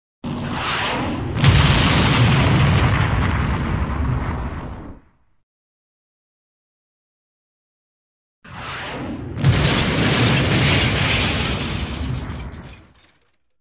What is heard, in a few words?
magic